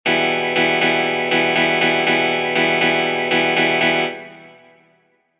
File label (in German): Schlagmuster